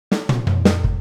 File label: Boogaloo - Full Mix, 82 BPM; Drums; Intro; Sonic Foundry; Drums from the Big Room The Mixes